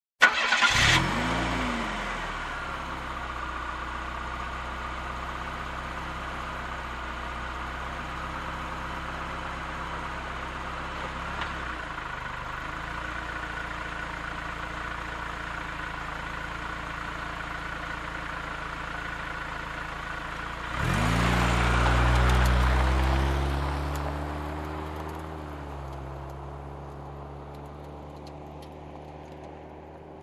Car Engine ignition drive off

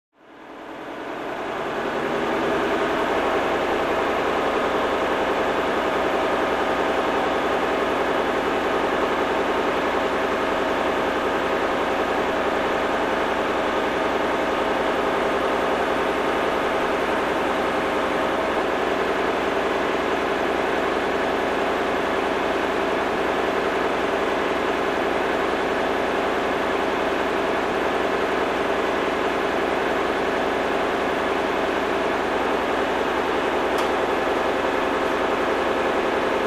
Machinery Buzz

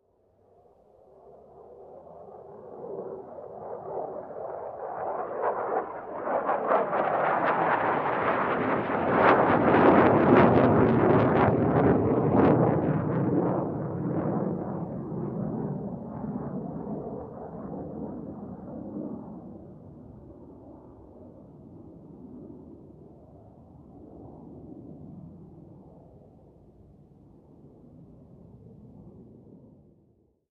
Commercial Aircraft Flying Over

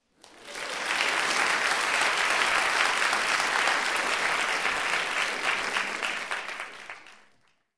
Audience Applauds

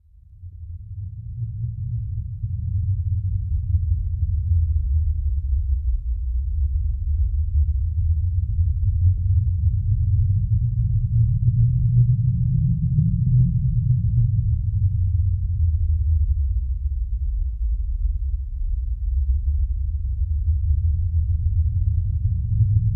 Wind Low Rumble

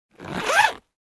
Bag Zipper close

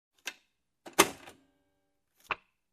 Compartment close

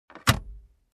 Closing Center Console Compartment

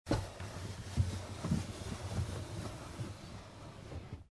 Lifting Car Window manually

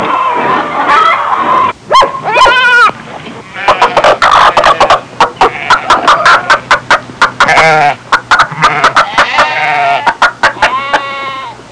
Various animals

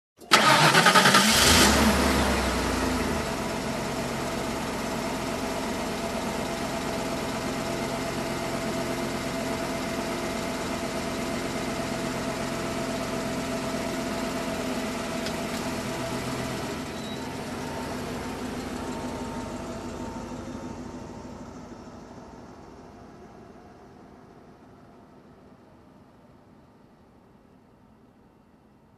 Car engine ignition

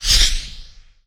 Subtle swoosh sound

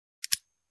Clicky gun reload

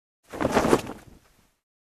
Shaking dust off a rag

